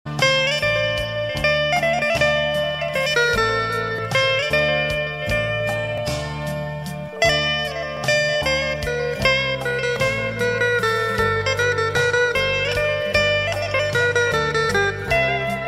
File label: turkish guitar solo